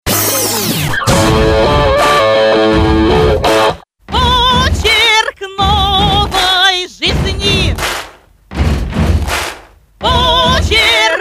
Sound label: mono bass